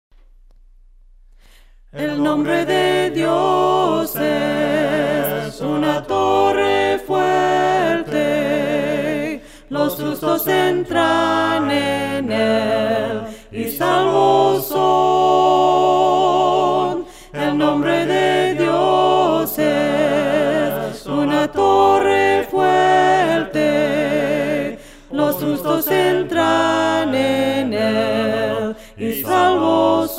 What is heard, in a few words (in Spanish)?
VOCES